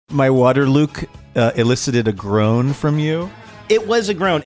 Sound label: groan; water